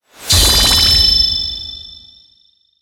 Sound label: big Win Txt Change; magic maid cafe